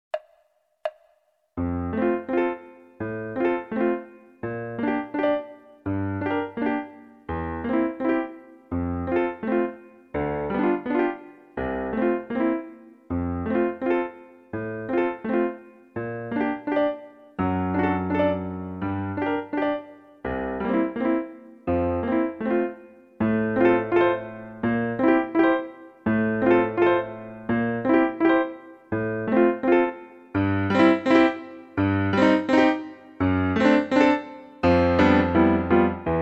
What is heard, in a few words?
fruhlingslied fl f-dur; mendelssohn